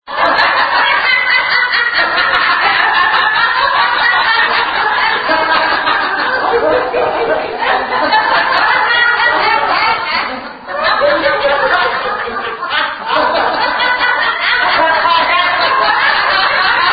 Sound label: laughter